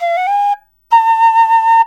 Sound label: SERULING, Heart of Asia; Spectrasonics; SERULING PH